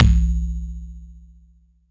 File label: BD